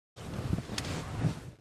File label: Car entry and seating foley